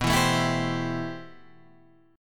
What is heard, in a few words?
A-Minor 7th B; Am7/B strummed; JGuitar